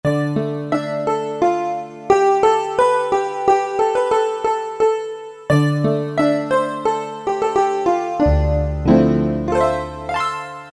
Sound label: New Age Piano, Roland PN-D50-04